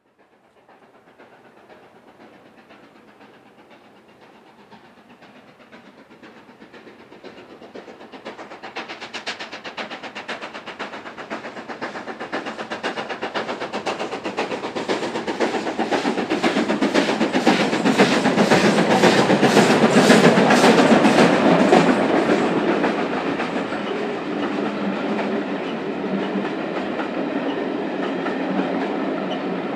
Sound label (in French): locomotives a vapeur; sonotheque